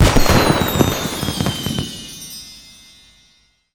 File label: Christmas Fireworks; xmas